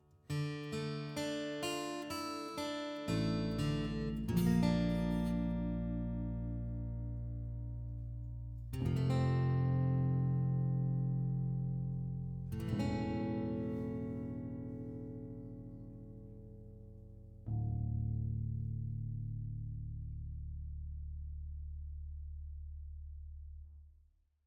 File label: Cut Wire Pickup; Firewatch